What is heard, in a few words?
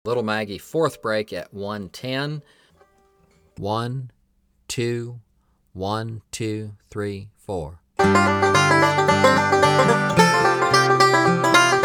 110 Bpm; Little Maggie Break 4